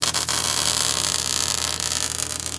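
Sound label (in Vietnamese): Tiếng điện xẹt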